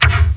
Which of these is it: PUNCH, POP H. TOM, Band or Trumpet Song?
PUNCH